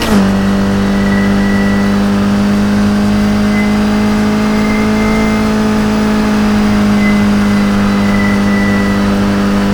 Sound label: fourth, vehicles; jopolestar; nfs heat ganimator pack